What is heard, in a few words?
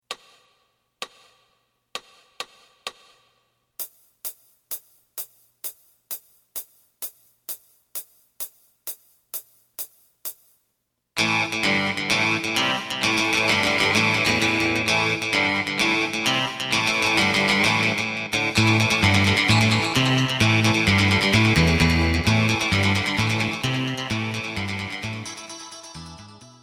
drum